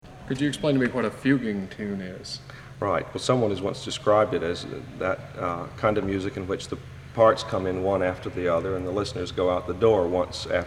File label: Fuging tune, Sacred Harp Singers; Cobb